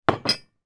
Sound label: household teapot place down on table